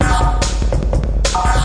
145 moody roller synth and beat; 141 to 160 bpm

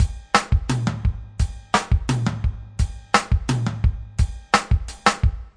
172 dropout twice toms; 161 to 180 bpm

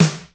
SNARE, Alesis HR16

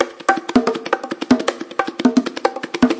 160 tricky bongos; 141 to 160 bpm

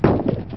Shotgun, Cheesy Lo-Fi Sound Effects

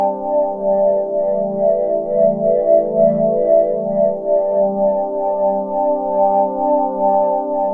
mks pad; Pads

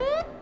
silly elec fx

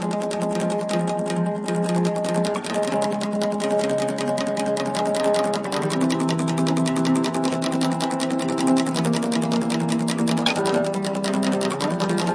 strummy guitar melody; GUITAR LOOPS

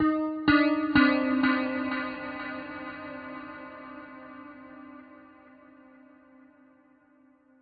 bell echoes, synth and electronic loops

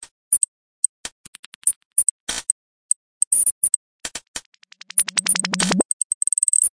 142 ambient beat; REMIXABLE COLLECTIONS; Kraddy Collection

